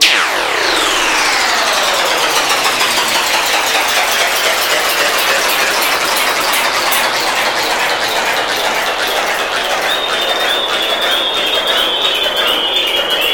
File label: Jaw Harp Cannon